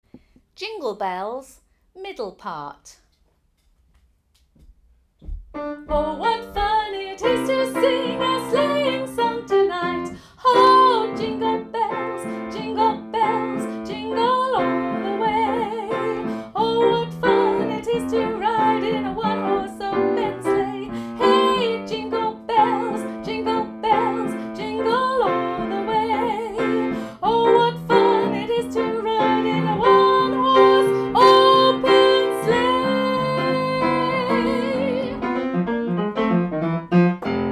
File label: Jingle Bells vocal harmony MIDDLE PART